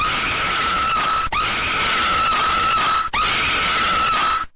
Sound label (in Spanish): susto grito